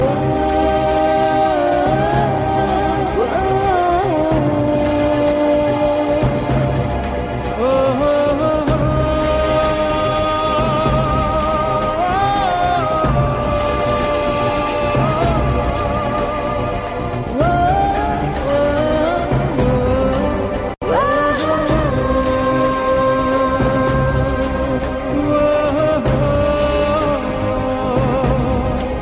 Sad Music

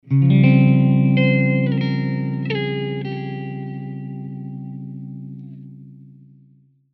Game Over

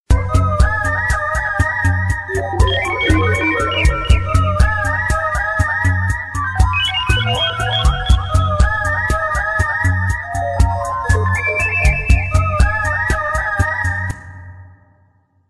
Spacey

